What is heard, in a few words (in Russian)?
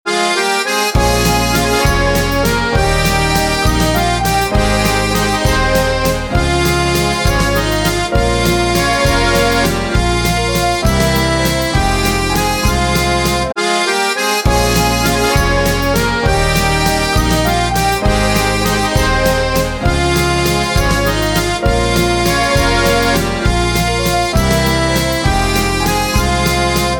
akkordeon solo